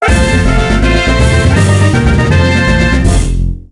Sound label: captured; infinitefusion